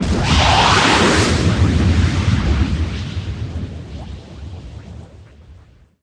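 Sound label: combat explode tie interceptor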